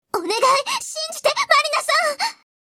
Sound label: alto; 明るく無邪気で天真爛漫。天衣無縫。純真無垢。